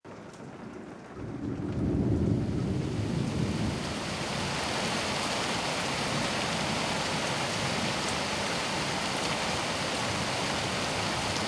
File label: A Cozy Thunderstorm ASMR